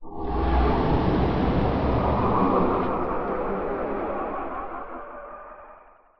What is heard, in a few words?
ambient; toxifin